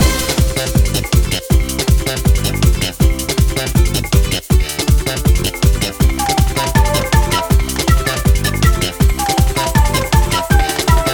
Easter Island Main Loop; boom karts multiplayer kart racing android

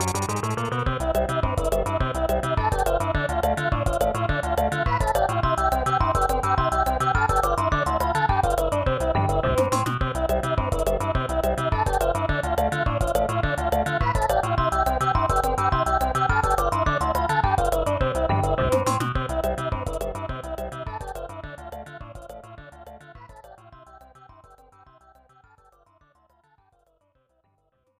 Magical Powerup; Doraemon 2 Nobita no Toys Land Daibouken; SNES

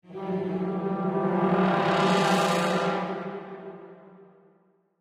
Stinger; Angry Birds Star Wars 2; Crimson Stinger Dark

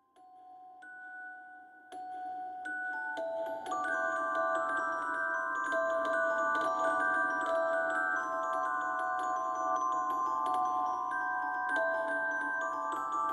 Musicbox Long; no man's sky

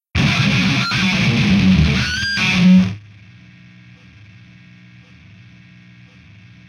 Success

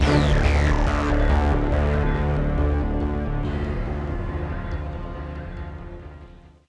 Fade; Unreal II The Awakening

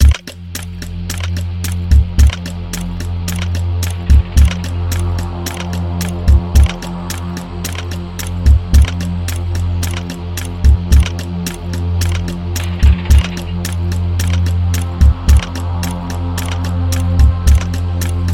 Dead suspense loop

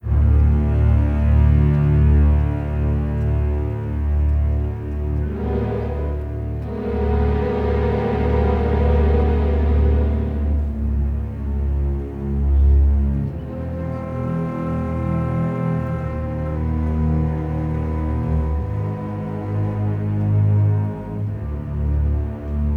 Quiet Creepy Ambient; Star Trek

